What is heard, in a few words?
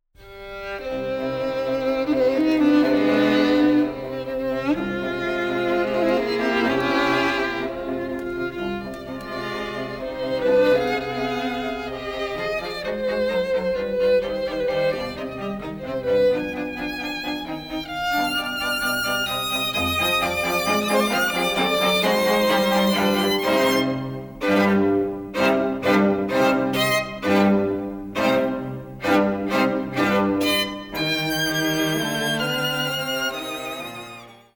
strings; beethoven